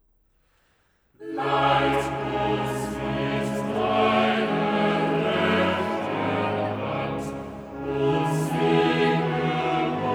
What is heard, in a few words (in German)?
Cantata, Choral; BWV 101 'Nimm von uns, Herr, du treuer Gott'; Bach; Braunschweig; 10th Sun After Trinity